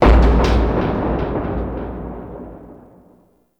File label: Lab Equipment Bang; Warframe; Ambience; Entrati